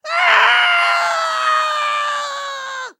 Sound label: scream; Sky Diving